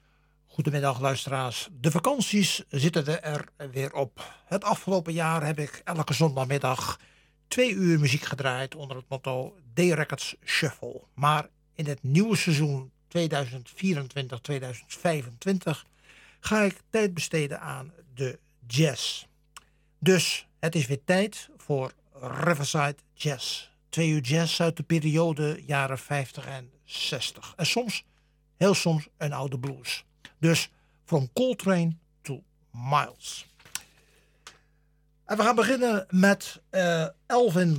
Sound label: Jazz